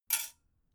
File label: dough hook drop